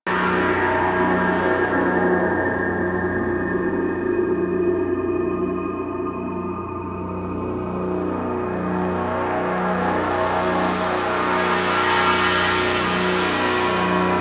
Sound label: Railjack Saturn Region Ambience Loop; Warframe